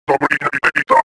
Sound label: eye fail; movers objects